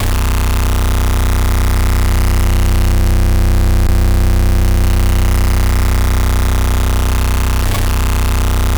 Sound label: tower sine